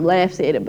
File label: laughs at it